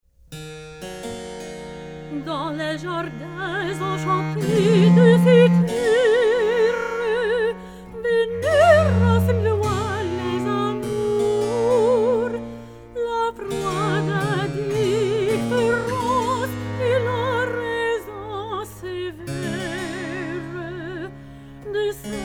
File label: Recitative; Clerambault